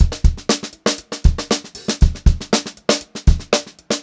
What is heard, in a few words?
119 groovy beat 1, funk groove drumloops; USB Soundscan vol.38; AKAI